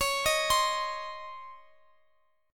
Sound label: C sharp Major 7th Suspended 2nd C sharp, Guitar Open F; arpeggiated; x,x,x,20,18,19